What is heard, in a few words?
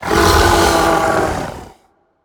wild dragon; DragonsLucky8WD; wazdan